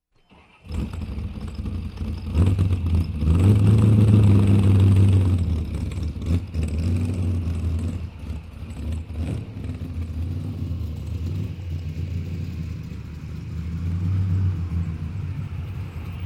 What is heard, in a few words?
Cruiser Motorcycle Engine start and running